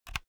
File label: insert usb laptop hard; objekte